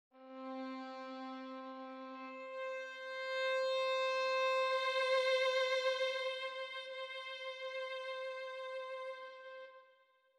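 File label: Vl solo vib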